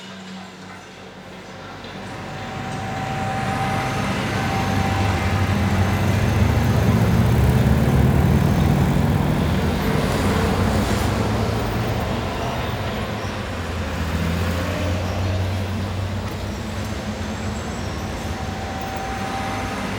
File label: Plaza Ambience